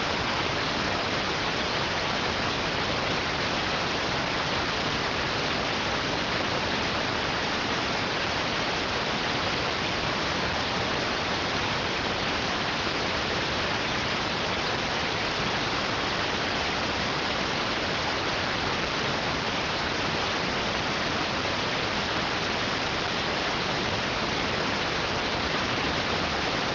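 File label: radioteletype receive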